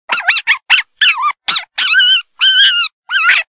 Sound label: Baby Red Fox, predator calls